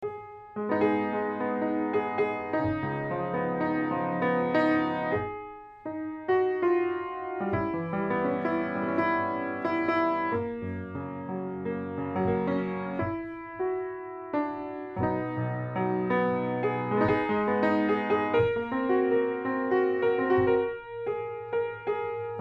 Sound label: Piano